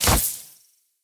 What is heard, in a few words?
Charge Attack Release